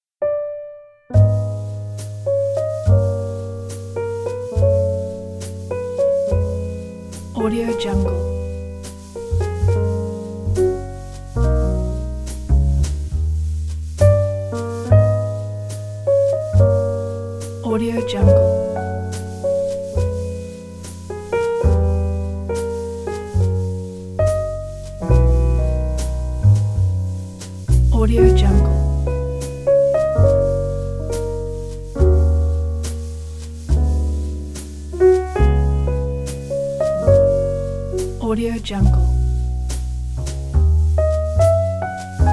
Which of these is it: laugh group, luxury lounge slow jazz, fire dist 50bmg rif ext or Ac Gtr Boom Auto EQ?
luxury lounge slow jazz